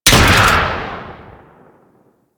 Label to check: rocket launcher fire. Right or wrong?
Right